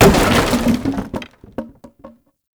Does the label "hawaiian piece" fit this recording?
No